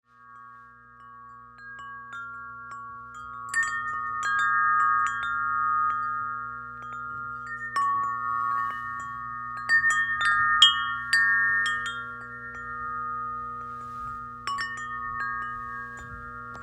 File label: chime, 30inch